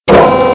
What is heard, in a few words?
Base Claw Motor; Playable Tutorial Level